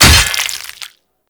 Axe Impact Flesh; Warframe

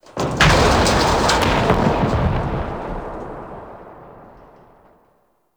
Tower Crash Stage One Debris; Warframe; Teralyst; Ropalolyst

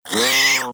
Feral Vocal Pain A; Warframe; Catbrow

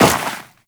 Walk Snow, Footsteps; Warframe; Tenno

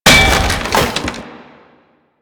Wood Bench Destroy

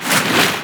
Unearth Proj By; Octopede; Warframe; ManInTheWall

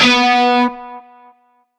Shawzin Oct Two B; Music; Warframe; Narmer Shawzin

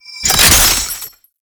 Bayonet Reload; Warframe; Tenno

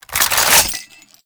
Reload Mag In, weapons; Warframe; Scaldra AK

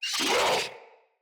Infested Charger Alert

